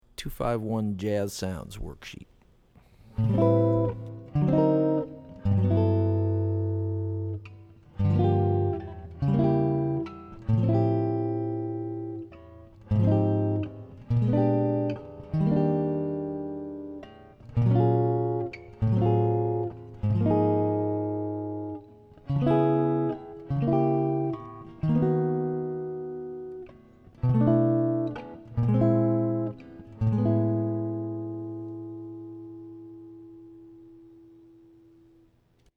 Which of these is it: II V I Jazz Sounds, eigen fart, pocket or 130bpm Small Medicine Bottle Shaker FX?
II V I Jazz Sounds